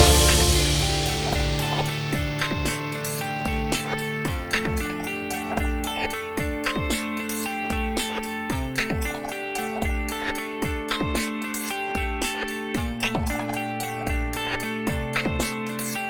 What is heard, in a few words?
Dungeon Theme, bgm